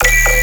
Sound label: Bass Hit D#, Dubstep; Twilight Bass; Ghosthack; AC2024 - Day 13 - Dubstep Sounds 2025